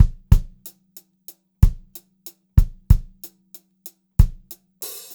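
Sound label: STRAIGHT VARIATION, Funk-Groove Drumloops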